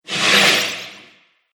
Firework Magic Hit

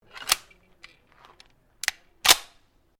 PISTOL TANFOGLIO 38 SUPER MAGAZINE LOAD

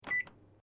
MICROWAVE SHARP BEEP SINGLE; Microwave oven; Kitchen Sound Effects; Kitchen (Sound Effects:Household)

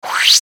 SWEEPER WIND, Sweeper Wind Quick Ascend; Production Element Imaging Element Accent Transition; Sweeper Sound Effects

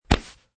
Grapple Noise; Clothes grappling noise

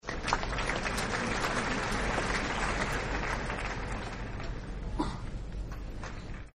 Applause Very Light; Sound Effects:Human; Partners In Rhyme

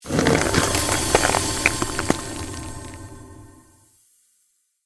CREATED MAGIC SPELL FREEZING TOUCH

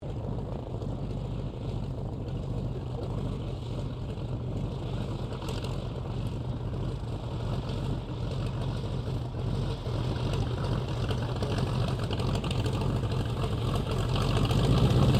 1916 Sopwith Pup replica taxi shutdown, airplane; Airplanes; Vehicles

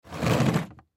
Case Slide Cement Luggage; Foley; Slides; Objects; Blastwave

